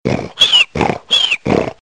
Snore Sms

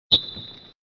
Ref whistle

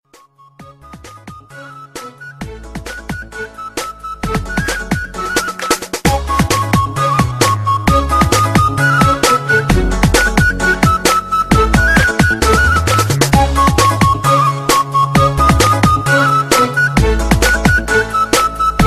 Soft Tone

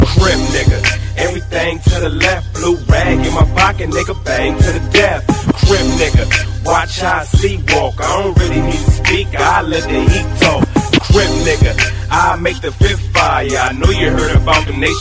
Crip Anthem

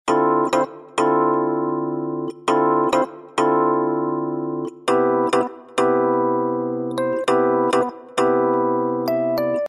Keyboard Ring

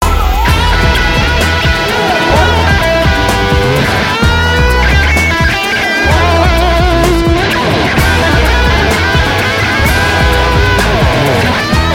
America Rock Solo

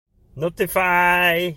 Notify yell

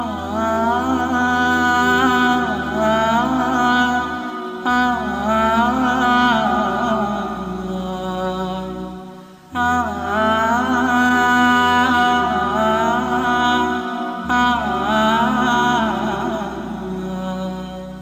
Last Breath

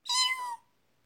Meow

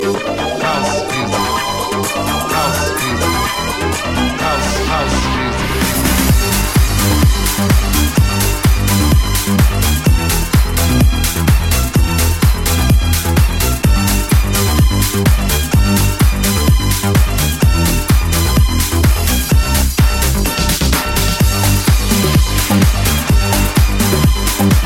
House Music

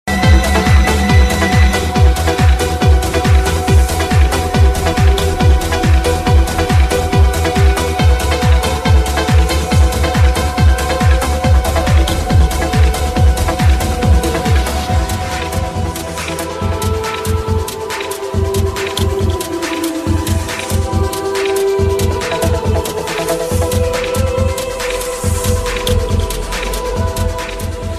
Dj Audio Mix Tone

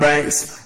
WUBZEY BASS

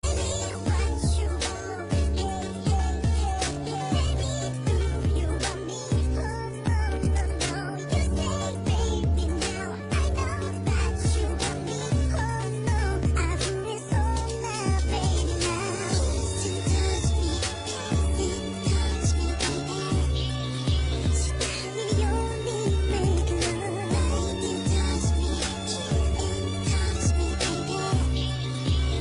Chip

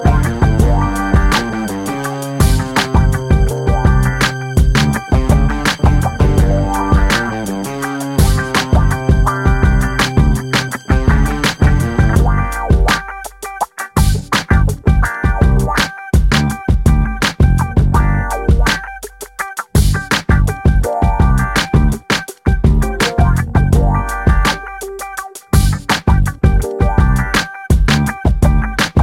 Beats

